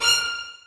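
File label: 2nd violins staccato e6; Sonatina Symphonic Orchestra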